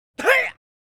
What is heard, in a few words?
male grunt punch; Grunts; Butterfinger Video Sound Effects